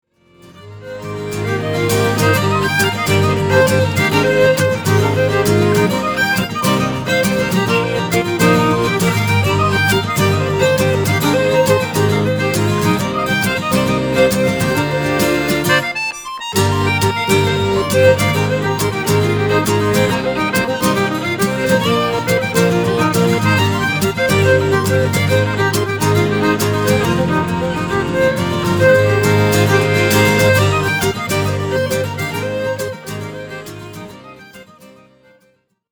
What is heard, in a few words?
Traditional Folk Band; London